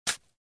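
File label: sounds of shovel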